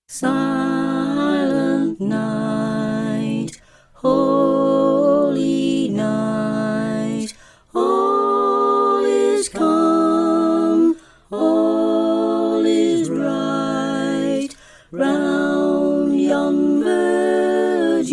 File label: Mezzo; Carols; Choir Harmonies; Silent Night